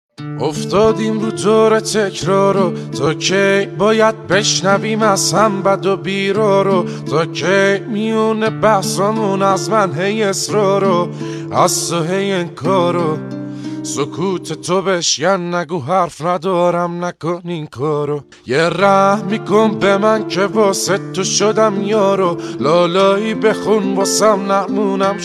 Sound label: guitar